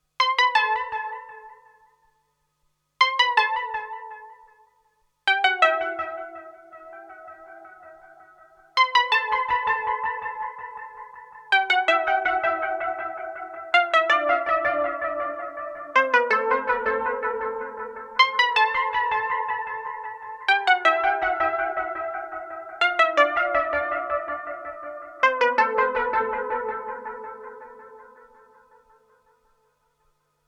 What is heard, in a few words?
Strymon Time Line Dual Synth